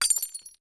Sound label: Crash Glass Break Martini Glass; Crashes